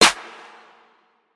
Snare

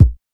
Kicks

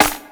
Snare

